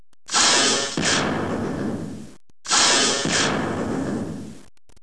Sno Blaster

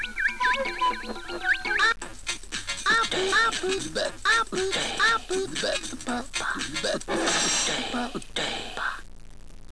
Singing Modvark

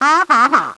Funny Honker

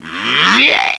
Freaky Honker

